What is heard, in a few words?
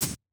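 hawaiian hh, hawaiian hi-hat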